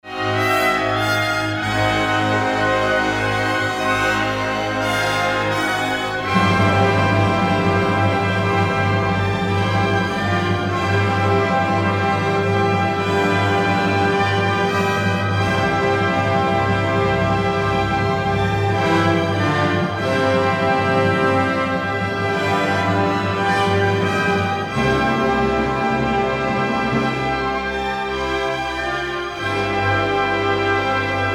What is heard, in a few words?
Climax Timpani only